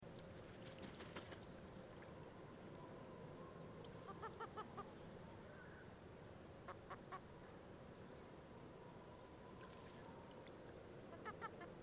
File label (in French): plongeon catmarin, chant